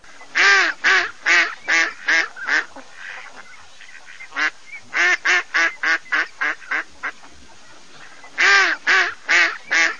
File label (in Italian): germano, birdsound